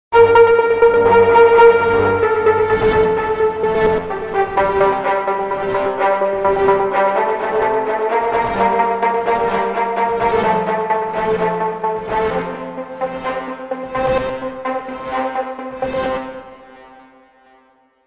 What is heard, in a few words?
Circular Thinking ARP